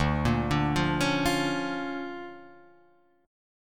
D Minor Major 7th Sharp 5th, D

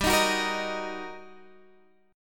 G Minor Major 7th Sharp 5th G, Guitar Drop D; chordshape; down

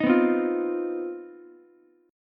C Minor Major 7th Double Flat 5th C down Ukulele; strummed; JGuitar

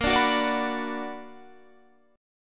B Minor Bb, Bm/Bb chord, guitar; strummed

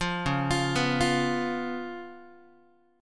Db 7th Db down Guitar; Db7 arpeggiated; JGuitar

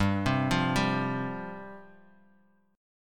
G 7th Suspended 4th G down Guitar Half Step Down

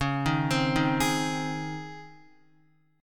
Db Major 7th Suspended 2nd Db Guitar; x,4,3,5,3,4; 4F,4A,5C,5G,5C,6E; arpeggiated; chord shape

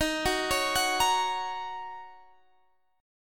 D sharp Minor 7th D sharp, down, Guitar, Standard; arpeggiated; JGuitar

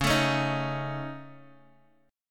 Db Suspended 2nd Sharp 5th, Guitar, Open D

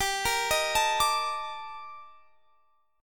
D sharp Major Sharp 11th, G

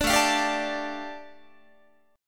A 7th Suspended 4th Sharp 5th A, Guitar; A7sus4#5 strummed

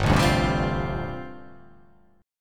G 7th Suspended 2nd Suspended 4th

